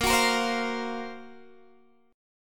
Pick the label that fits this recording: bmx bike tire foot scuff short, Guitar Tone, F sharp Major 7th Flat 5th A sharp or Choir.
F sharp Major 7th Flat 5th A sharp